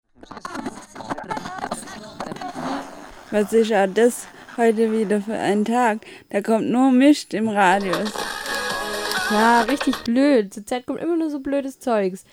jingle

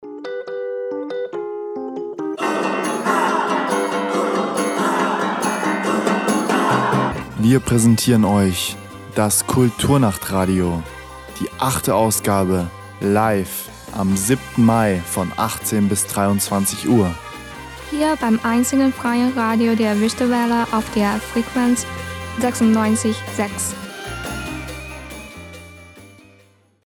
Jingle; Kulturnacht 2022

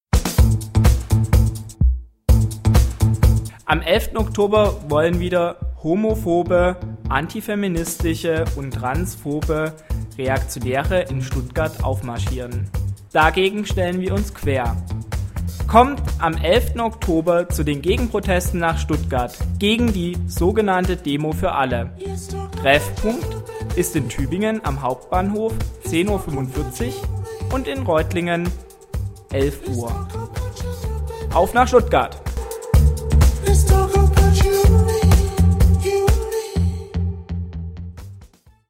demo jingle lang; 11 oktober stuttgart

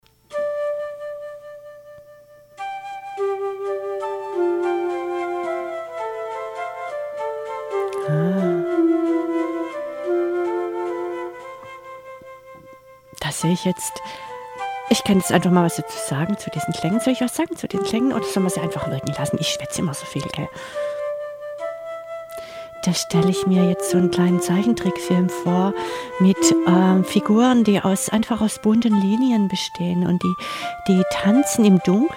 floetende yamaha

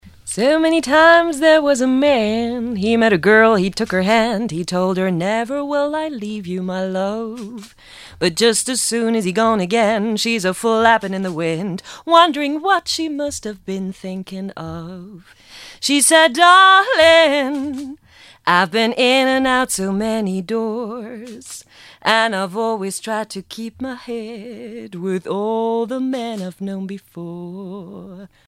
spontane Gesangseinlage

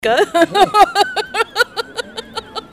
O-TON GELL LACH

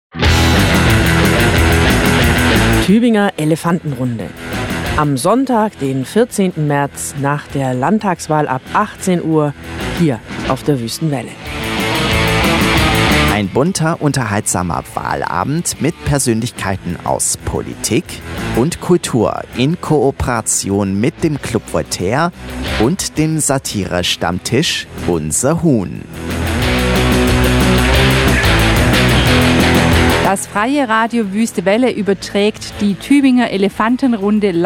Werbejingle; Wahlabend; Tuebinger Elefantenrunde

